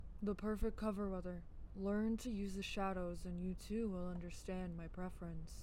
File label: rain